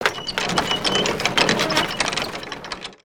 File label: Tiki Torch long Shake